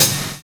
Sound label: WHISTLER; Univers Sons